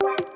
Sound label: Synth Woop